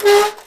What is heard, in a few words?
squeek; Sonic Foundry (Sony Creative Software); Ugly Remnants VOL-2; Beatstuff